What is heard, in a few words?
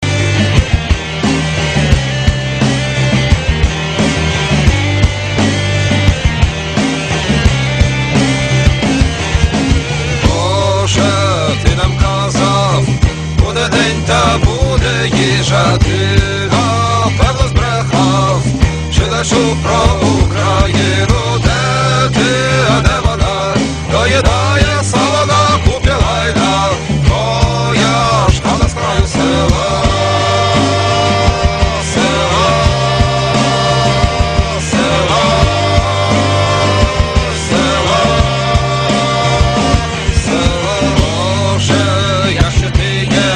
Ukrainian music; modern